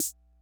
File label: Ace Tone Rhythm Fever Snare, Drum Machines; 300 Drum Machines